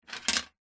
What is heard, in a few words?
money coin set down on metal surface